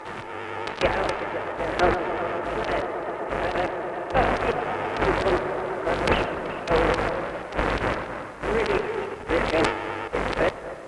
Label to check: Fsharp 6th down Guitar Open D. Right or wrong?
Wrong